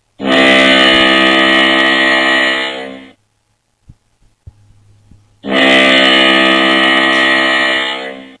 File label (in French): corne brume